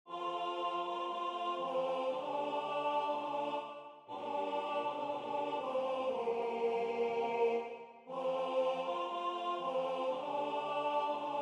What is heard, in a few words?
In The Bleak Midwinter alto; Waiheke Island Choral Society